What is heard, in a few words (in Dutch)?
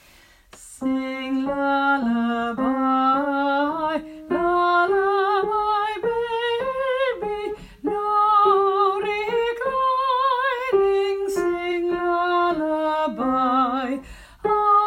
sopraan